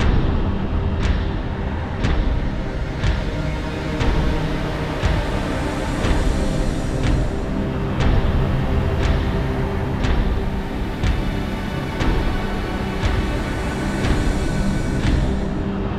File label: Danger Tonal; Insanely Twisted Shadow Planet